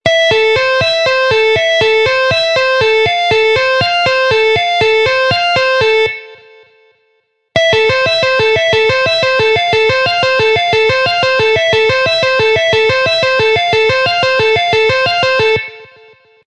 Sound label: tapping sound, guitar